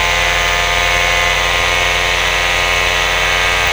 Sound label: saw loop; weapons; Sourceforts2.0